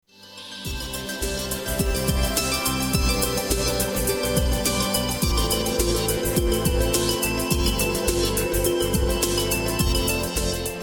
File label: Medley Instrumental Midnight